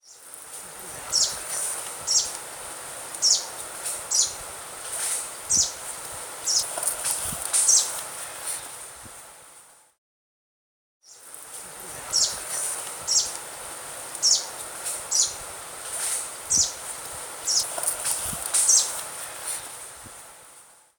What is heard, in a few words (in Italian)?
luì verdastro call; Greenish Warbler; Phylloscopus trochiloides; Passeriformes; Phylloscopidae; Povegliano, Treviso, Italy; Luca Boscain; Birding Veneto